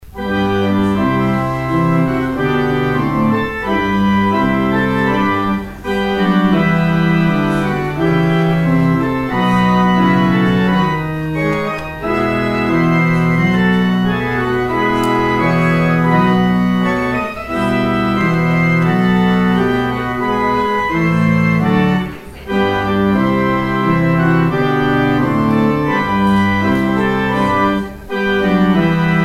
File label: organ outro; exit music